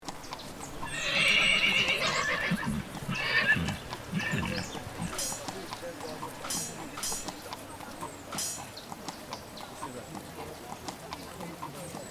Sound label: soundscape medieval; chris linin